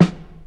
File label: acoustic snare f key; Mono